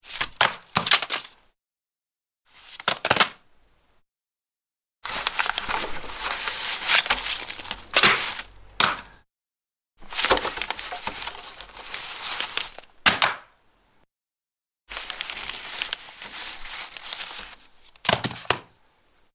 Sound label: Letter Drops, Various letter drops through letterbox.; Objects